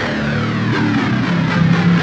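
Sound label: Guitar (metal); misc; Sonic Foundry (Sony Creative Software); Ugly Remnants VOL-2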